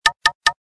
Knock; KP500 Cookie

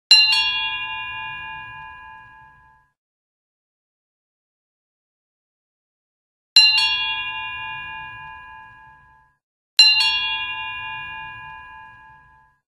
Ring Zen; HTC AT&T Tilt 2

